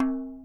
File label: CLAY FRAME, CAMEROON; AKAI S6000 CD-ROM; WorldSounds